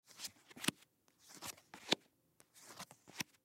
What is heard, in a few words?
Cards Flip Cards, Cards Flip Over Card From Deck To Table Multiple; SPORTS; SoundEffects-Blastwave